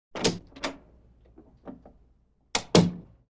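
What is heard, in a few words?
Trailer Door Open Close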